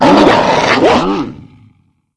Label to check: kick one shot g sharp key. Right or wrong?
Wrong